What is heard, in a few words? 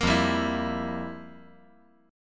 F Major 7th Suspended 2nd Suspended 4th; down; 5G,4C,5E,5A; chord shape